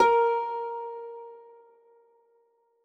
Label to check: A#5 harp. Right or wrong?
Right